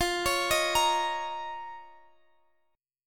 F 7th Suspended 4th Sharp 5th F down Guitar Drop D